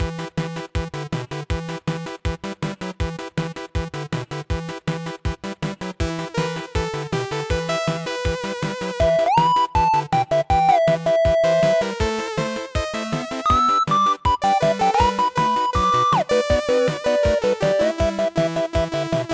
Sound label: 8 Bit; Racing Mini Game